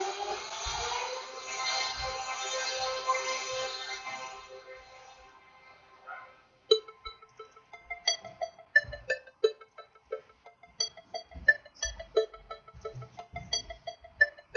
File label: HIP HOP